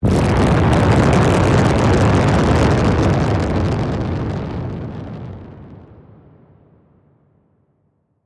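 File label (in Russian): эффект разрушения damage sound fx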